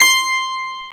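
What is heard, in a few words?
Harpsichord; CMI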